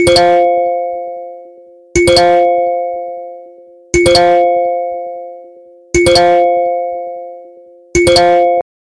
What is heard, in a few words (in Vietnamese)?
tin nhan grab driver